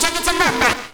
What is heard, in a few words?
Vocal Phrases; Vengeance Samples; Vocals